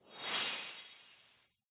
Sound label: win indicate; hades River Of Souls Desktop; Fantasma